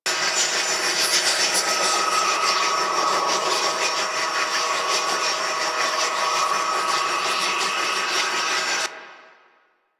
Pistol Active Reload Standby Loop; Weapons; Warframe; Drifter; Operators

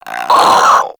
Infested Crawler Hear Sound; Warframe

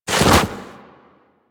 Walk Deep Snow, Footsteps; Warframe; Operator

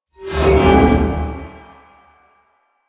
Weakpoint React Screech; Enemies; Lasrian Tank; Warframe

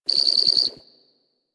Garden Animal; Warframe; Clan Dojo; Dojo Garden Animals

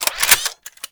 Shock Rifle Reload, weapons; Warframe; Corpus

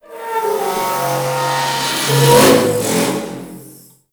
Ocean Pipe Moan; Warframe; Grineer Ocean

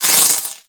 Sword Elemental Electricity Whoosh; Enemies; Weapons; Warframe; Teshin

